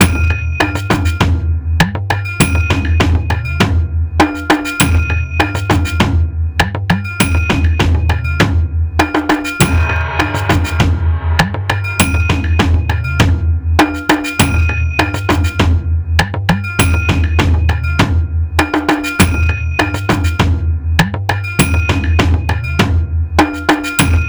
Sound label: JAPAN; INIS; Funky Xtreams